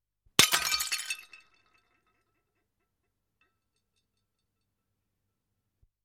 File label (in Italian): VETRO COCCI ROTTI